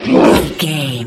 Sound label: troll monster attack fast